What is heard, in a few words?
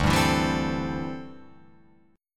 D 7th Suspended 2nd Suspended 4th D, Guitar Open F; D7sus2sus4 strummed